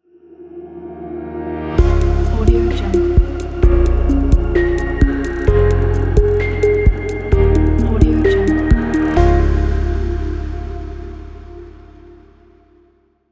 calm technology logo

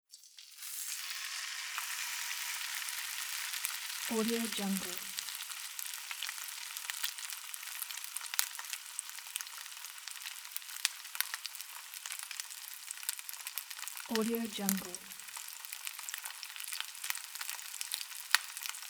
fish sizzling and frying in a pan

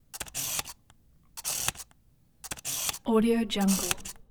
button small servo; soundmorph

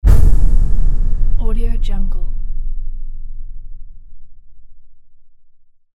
impact; volkovsound

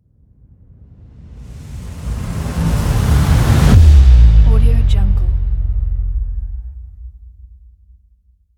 cinematic bass drop transition

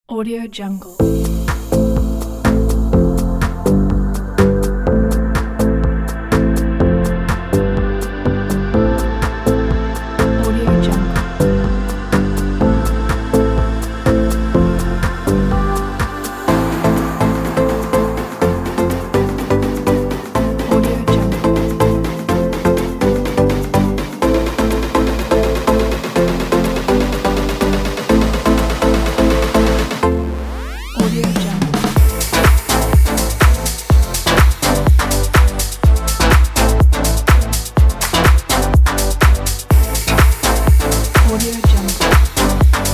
dance

